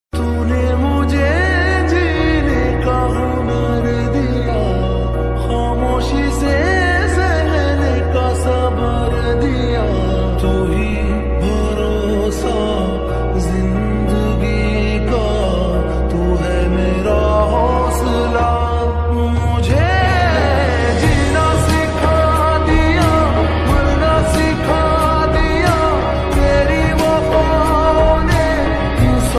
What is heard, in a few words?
sed song lofi